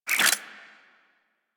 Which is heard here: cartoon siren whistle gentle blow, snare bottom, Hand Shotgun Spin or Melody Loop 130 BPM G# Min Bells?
Hand Shotgun Spin